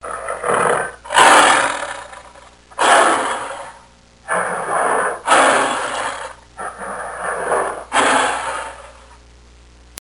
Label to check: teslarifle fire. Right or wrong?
Wrong